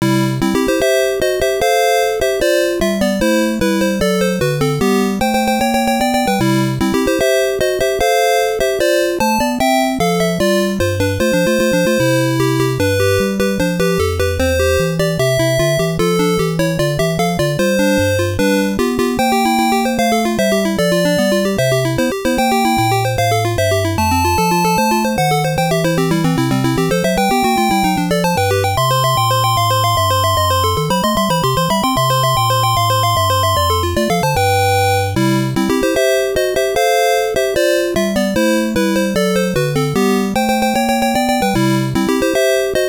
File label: Complete